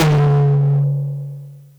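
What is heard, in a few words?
TOM loud; Zero-G; Chemical Beats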